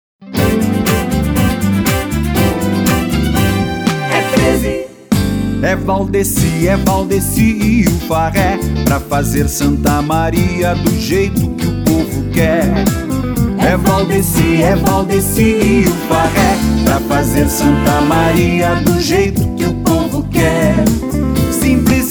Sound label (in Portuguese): Jingle MPB